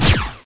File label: Laser Beam